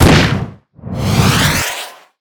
missile launch; weapons

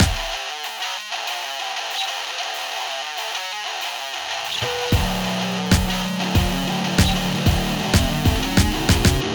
desert boss intro, music; bgm

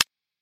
ability machine tick

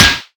latch; cybermachine

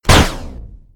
shock orb; weapons

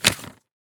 scroll tick

